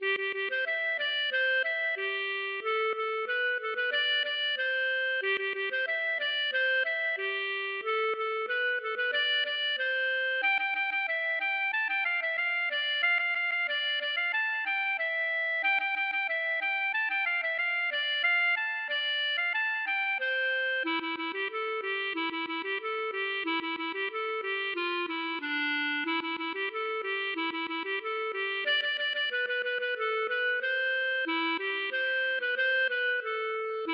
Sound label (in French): aeroplane; Acigne